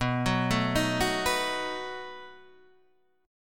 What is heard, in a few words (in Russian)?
Perebor B7